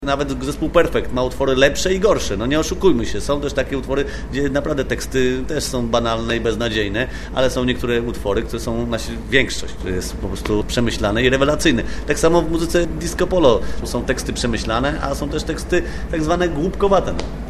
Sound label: disco